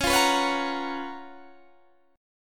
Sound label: C sharp 13th C sharp, Guitar, Standard; strummed; C#13; JGuitar